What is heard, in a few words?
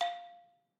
Ethnic Xylo F4, Balafon, Traditional Mallet; Idiophones; Struck Idiophones